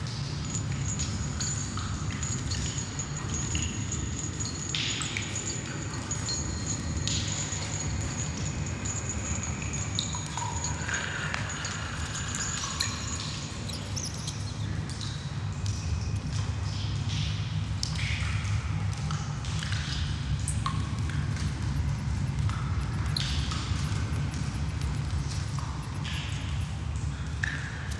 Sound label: sewers loop, ambiences; Michael Ghelfi; RPG Ambiences Vol. 1 (Short loops)